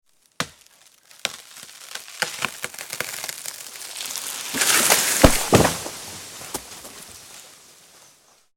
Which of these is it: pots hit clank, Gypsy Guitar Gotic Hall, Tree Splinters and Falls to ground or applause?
Tree Splinters and Falls to ground